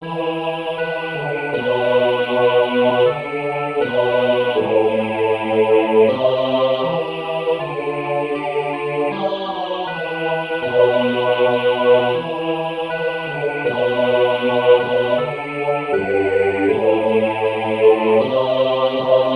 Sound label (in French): basse